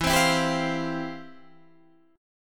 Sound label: F Major 7th Suspended 2nd, E, guitar